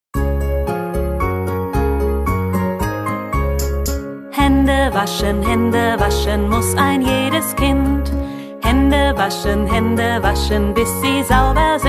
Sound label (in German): Hände waschen Bewegungslieder zum Mitsingen Kinderlieder